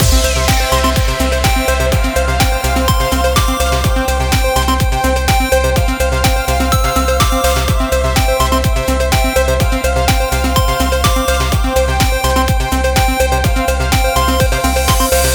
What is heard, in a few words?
trancy; rhythm-quest